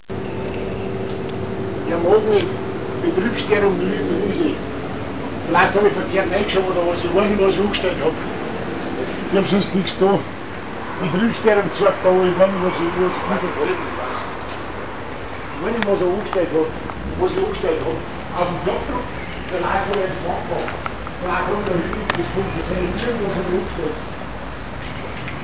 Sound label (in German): STOERUNG